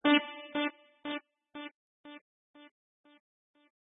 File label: quarter note delay